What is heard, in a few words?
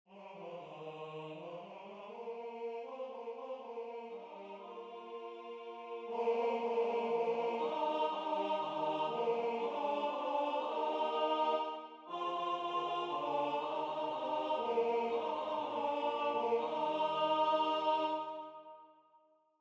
bacchanal alto; Christmas 2026